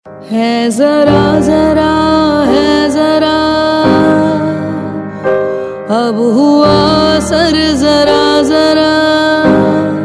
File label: Female Ringtone; Bollywood; Waiting